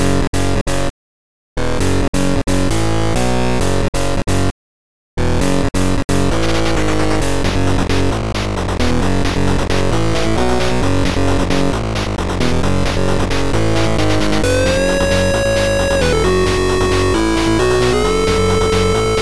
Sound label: 8bit Overdrive